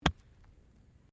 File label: Golf Swing Wedge Fairway; Sports; Various Golf sounds